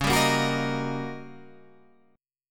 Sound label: D sharp 7th Sharp 9th